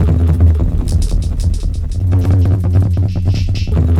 Gamma Radiation, Environments; Sonic Foundry (Sony Creative Software); Myths of Technology

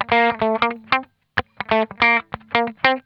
Funk Guitar; Zero G - Funk Guitar

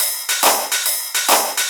Shuffled Loops, 140 BPM

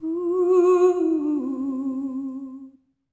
SERENE, Symphony of Voices; Spectrasonics

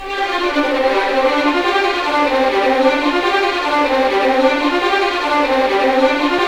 RIVER; East West; Scoring Tools; ORC; River Flow

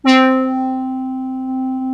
ANALOGUE; Rubber Chicken Software Sample Bank; CYPERPUNK 2

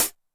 closed hat Long Decay, 909; classic machines; 909mod

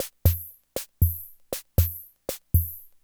Loop, classic machines, rhythm77

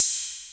JD800 Dance Card Drums

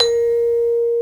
A#3 vibe, vibraphone; InVision Interactive; Lightware VOL-1; Instruments & Percussions

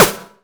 Snare; ModelCycles

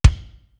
REGGAE; East West - Global Sounds; D4 BD SD TOM

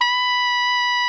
tenor saxophone FFF B5; Greytsounds - Philip Wolfe David Crozier's Saxophones; TENOR SWITCH

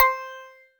THROATY C5; Synth

